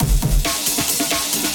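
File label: drum beat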